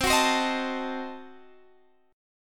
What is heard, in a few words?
C Diminished 7th, guitar chord